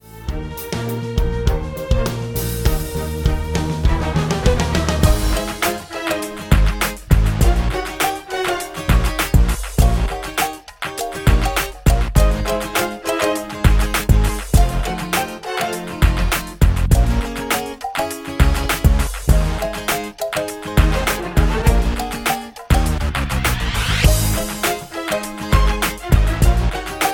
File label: Accompaniment